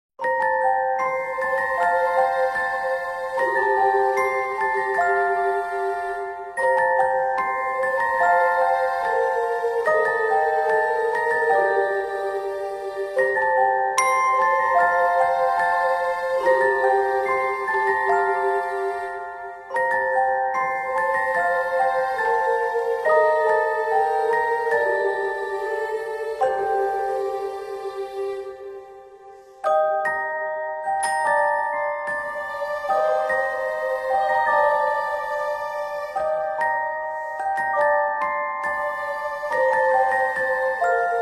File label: Spooky Vibes for Halloween